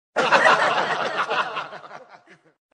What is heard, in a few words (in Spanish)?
risas